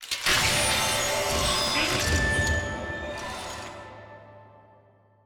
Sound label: wheel Stop Click; netent